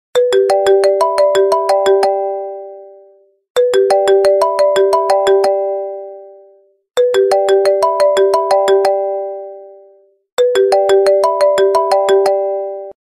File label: original iphone ringtone